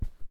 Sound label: Footstep Carpet